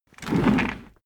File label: office chair worn trundle fast